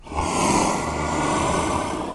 summ dragon

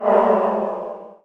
fear

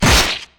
claw

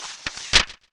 book

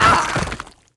die man

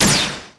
magic dart

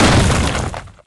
blast

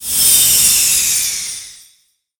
freeze

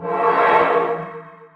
portal open

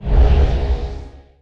divine summ

